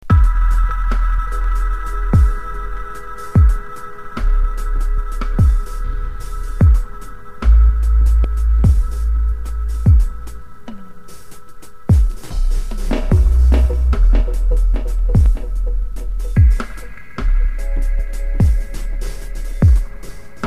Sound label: Dub